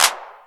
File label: 808 Clap; Quasimidi Rave-o-lution 309